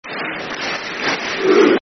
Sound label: Clapping Chant, Football Chant; Tranmere Rovers Trfc